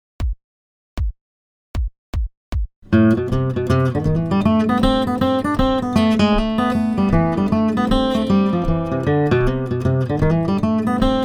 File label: Guitar Billy in the Lowground; Jack Tuttle’s Bluegrass Guitar Primer; common fiddle tune out of C